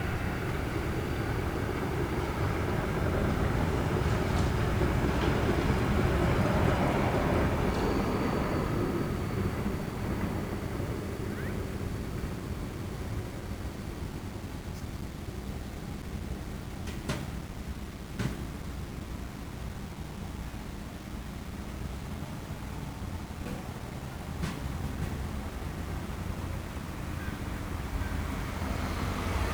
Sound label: drive ambience; valve; barroom